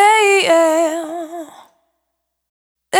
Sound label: Urban, Single Voice Melodic Loops, Vocal Samples; Pocket Diva World Class Vocal Samples